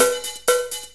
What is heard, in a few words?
DRUMLOOP; SoundFactory